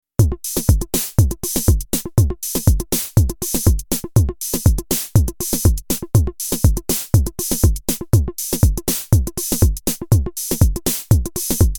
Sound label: SZ-Swing